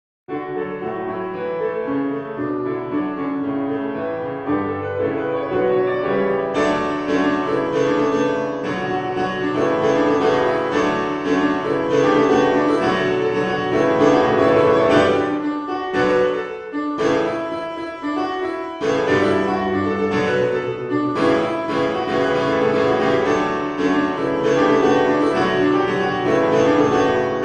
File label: Title Theme, Piano Cover; MLP FiM